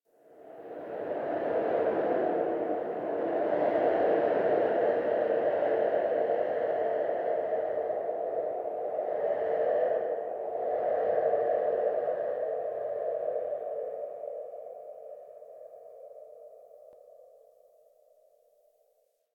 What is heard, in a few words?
Dark Wind